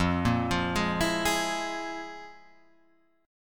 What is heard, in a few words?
F Minor Major 7th Double Flat 5th, chord